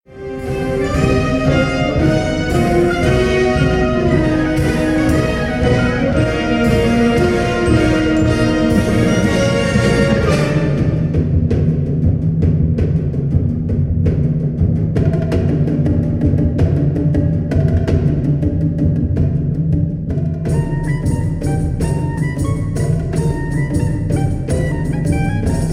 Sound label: floating flags